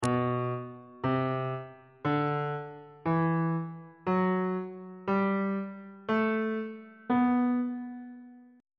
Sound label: c flat phrygian mode on bass clef